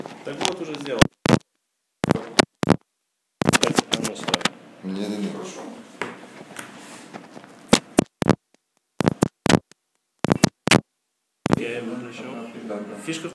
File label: iphone